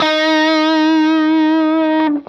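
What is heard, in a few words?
fuzz guitar vibrato; MAYFUZZ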